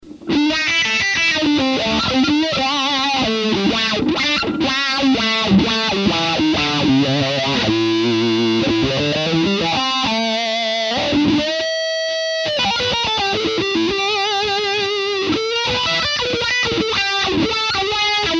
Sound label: CryBaby, wahwah